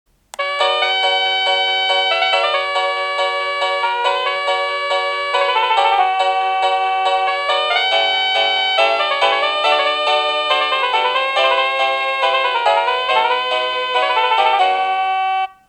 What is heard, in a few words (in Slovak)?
Ukážka melódie zvonenia zvončeka